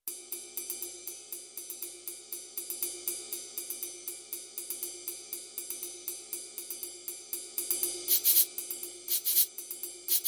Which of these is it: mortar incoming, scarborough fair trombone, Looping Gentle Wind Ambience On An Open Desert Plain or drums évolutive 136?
drums évolutive 136